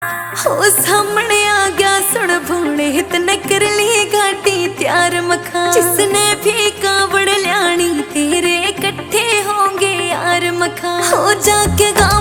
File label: Ringtone